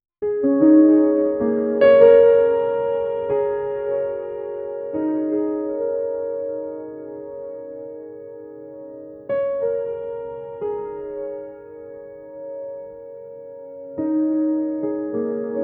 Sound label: sparse felt piano isolate; instrumental